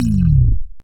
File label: Spacey